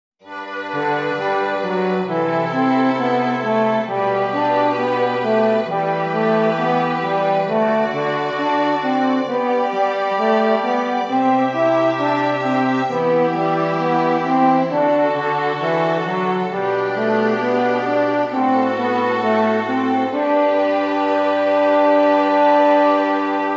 Stimmiger Contrapunkt; Ronge; corretto

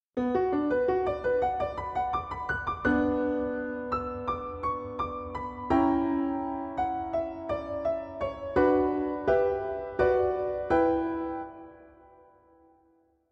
Klavierstuck abbozzo

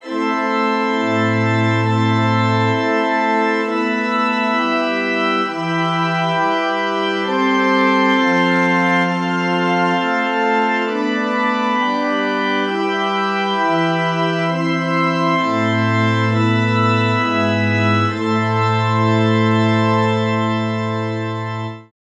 Stimmiger Contrapunkt; Ronge

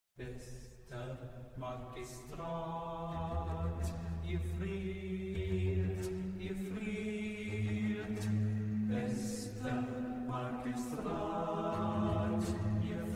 Kanon fur 4 Basstimmen mit Begleitung von Violoncell oder Kontrabas

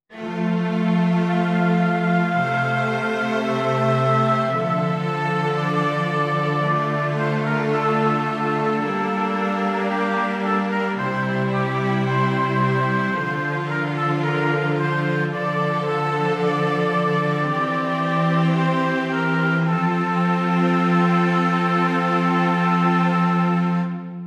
Stimmiger Contrapunkt; 5 Gattung; Ronge

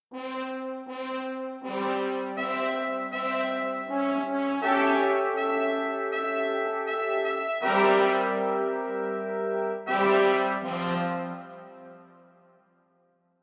Anfang einer mehrstimmigen Komposition; Tibi Gratulor

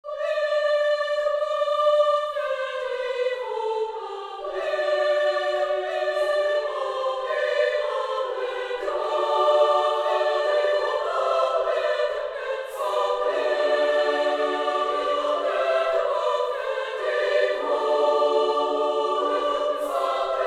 Vierstimmiger Kanon; auf die textworte Gluck fehl dir vor allem

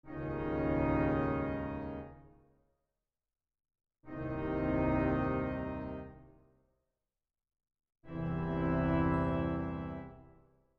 Terzen

